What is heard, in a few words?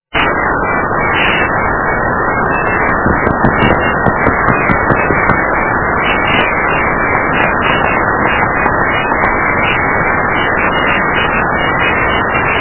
bad call; chrome